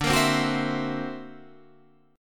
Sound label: D Augmented 9th down, guitar